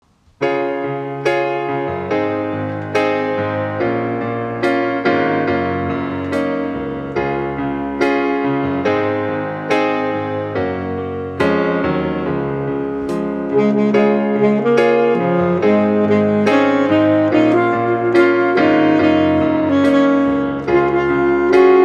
sax

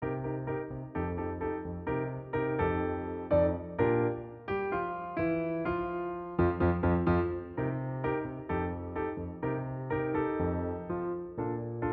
cha cha accompaniment; from zoo time

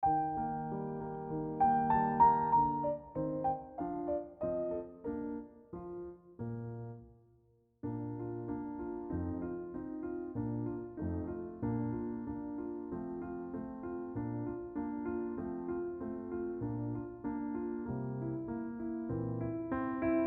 accompaniment; wolfgang amadeus mozart; il mio tesoro; from don giovanni k. 527

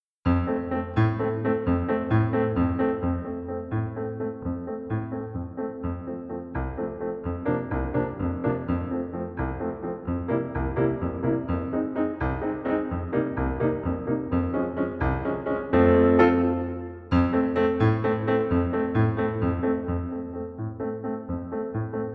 Eb accompaniment

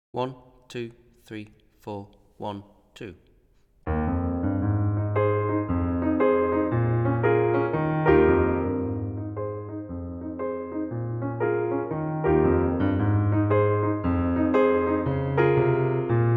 Eb accompaniment accompaniment only

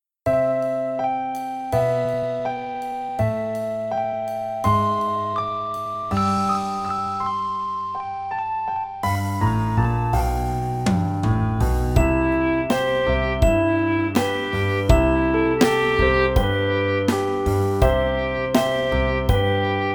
clarinet

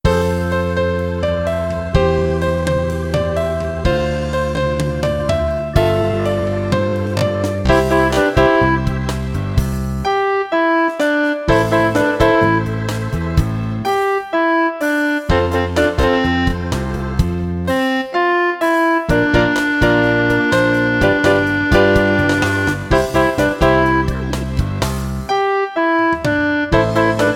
clarinet

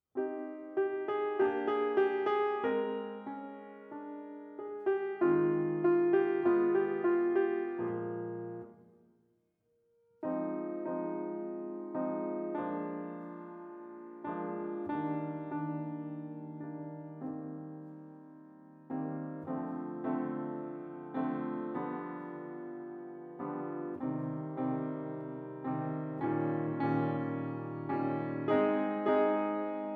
accompanyment only

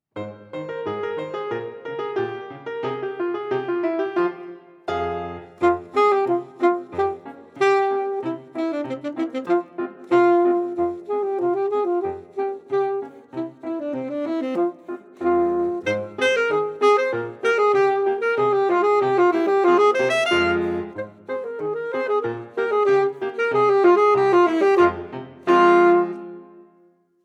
polka

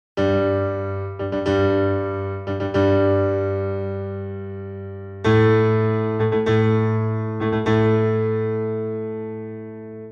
Eb accompaniment; julius fucik; entry of the gladiators op. 68 arr. ian denley

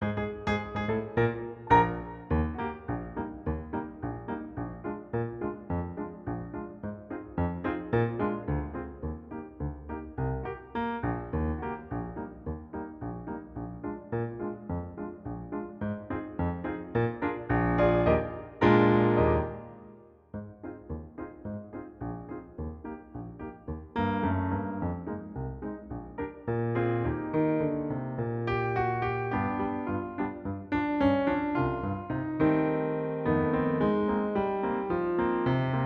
accompaniment only

